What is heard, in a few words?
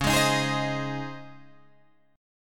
C sharp Major 7th Suspended 2nd C sharp, Guitar Drop D